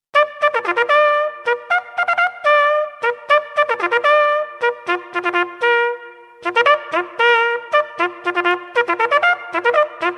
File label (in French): Musique Militaire